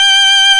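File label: Farfisa combo G5, electric organs; E-MU Formula 4000 Series Vol. 3 – Analog Odyssey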